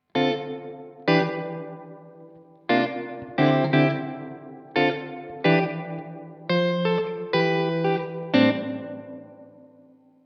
uafx golden reverberator

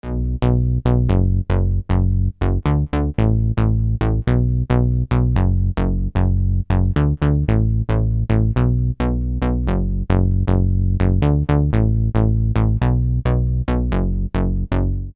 korg minilogue bass

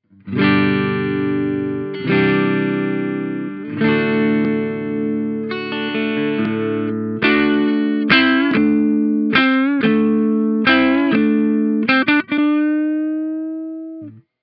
Tiny K clean; kammer tiny k test

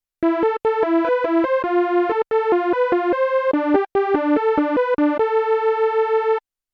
osc detuned; Lead-Sound erstellen am Synthesizer

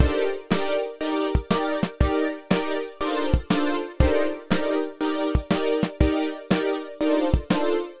pad keying; softube valley people dyna-mite

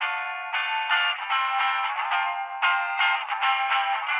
fabfilter pro-q 2 bandpass

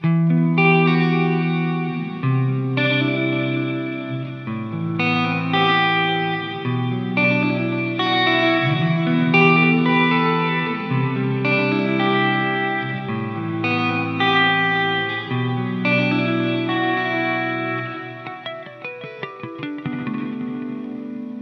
TAD Blackface 22 reverb

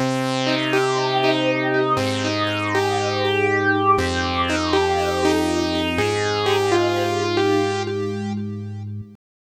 korg i3 synth; Korg i3 Test

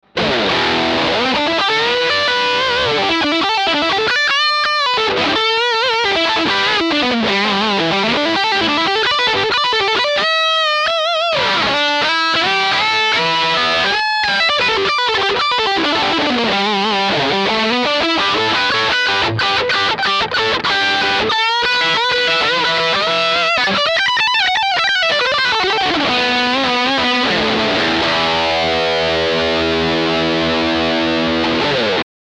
MXR EVH 5150 overdrive test

